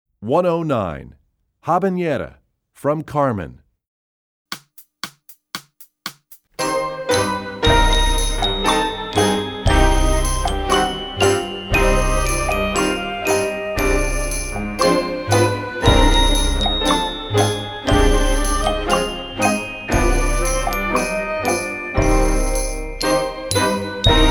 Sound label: Habanera, Percussion; EE2000 Book2